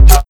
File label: Kick Blade